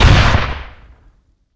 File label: apatche fire rockets; cameraman3